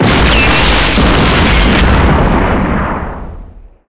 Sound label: Ammo Box Explosion; Red Shark